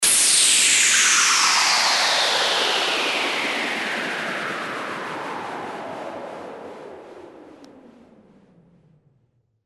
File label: WHOOSH